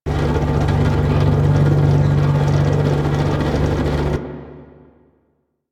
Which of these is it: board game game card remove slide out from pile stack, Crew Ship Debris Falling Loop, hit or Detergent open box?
Crew Ship Debris Falling Loop